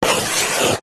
chalk stick thick draw circle on small chalk board; office